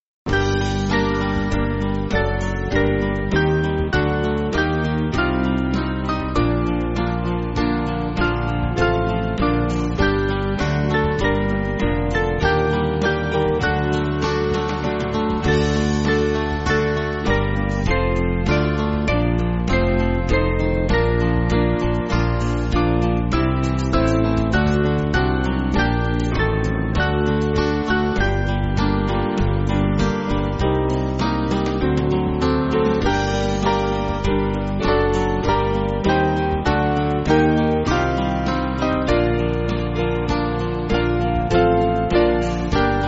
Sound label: Band